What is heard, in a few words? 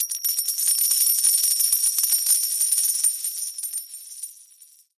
coin